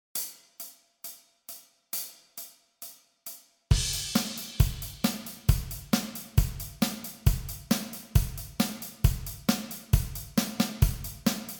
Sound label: 135 bpm, practice tracks